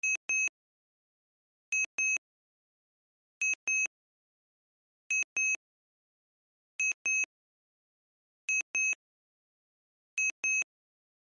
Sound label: Science Fiction Computer Beeps Loop; Computer Sound Effects; Computers (Sound Effects:Science Fiction); SFX Bible 6